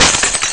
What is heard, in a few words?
super crash; Amiga Soundtracker Sample Packs